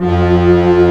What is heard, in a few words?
Organ, Barton Bold&Bras